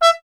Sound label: TP E; Miroslav Vitous - Solo Instruments VOL-1; Trumpet